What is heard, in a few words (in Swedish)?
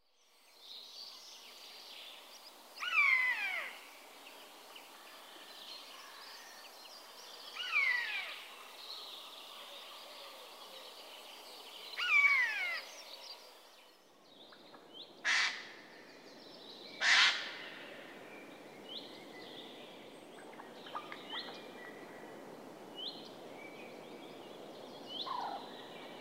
nötskrika